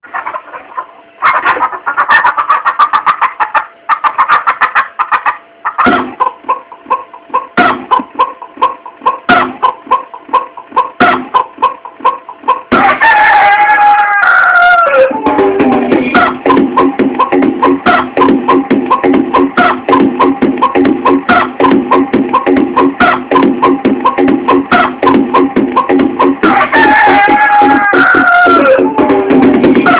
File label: Dance